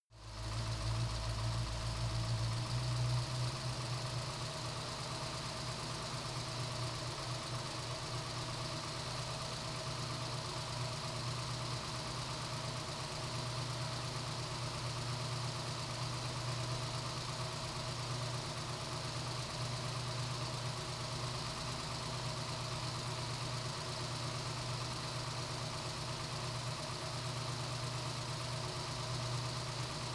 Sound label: car camaro idle faster engine